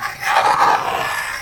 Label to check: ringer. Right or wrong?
Wrong